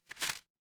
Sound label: paper fabric crumple